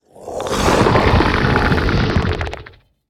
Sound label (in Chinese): 监守者咆哮声